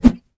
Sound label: bow miss; Fate The Cursed King